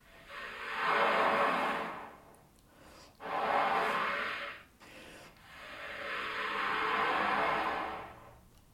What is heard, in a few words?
sweeps